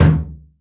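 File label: bass drum, 50S JAZZ; Northstar - New Gold VOL-1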